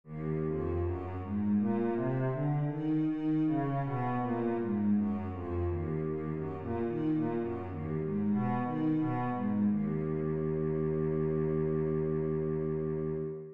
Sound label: cello E flat minor scale